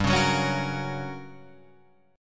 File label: G Minor Major 7th Flat 5th, G, down, Guitar, Drop D